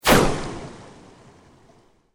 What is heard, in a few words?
Sweep Organic Fast